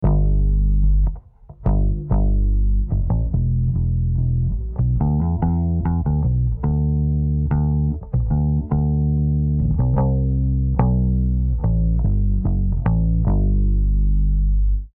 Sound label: bass